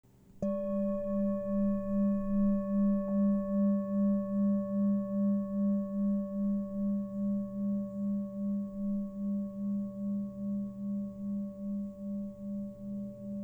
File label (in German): Erdender Buddha